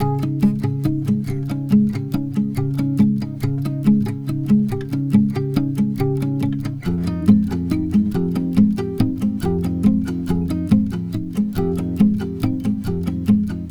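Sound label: Acoustic Pop Guitar 140BPM F minor; Vengeance - Essential Clubsounds Vol.4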